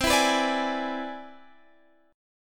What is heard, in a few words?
C Augmented Major 9th, Guitar, Standard; strummed; JGuitar